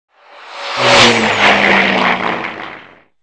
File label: flyby